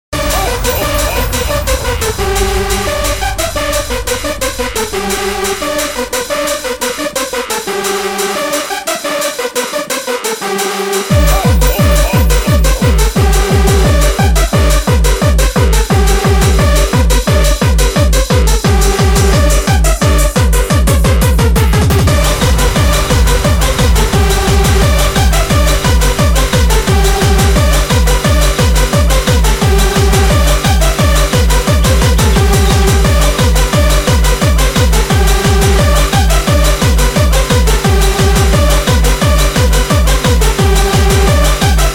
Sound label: hardcore techno